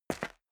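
step dress boots concrete grit single right, footsteps; schritte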